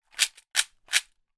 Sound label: foley coins money shake in container